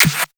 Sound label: clap snare; Anthemic